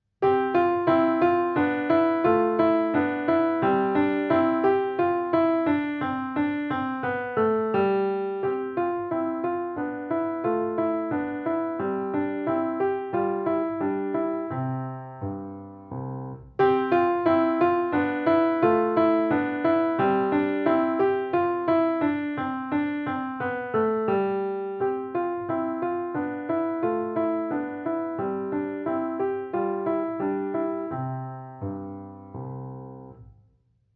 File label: Minuet